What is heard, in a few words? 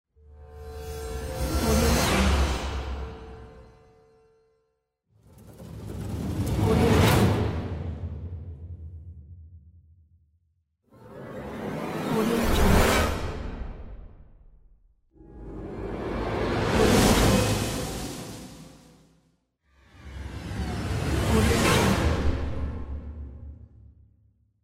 cinematic impacts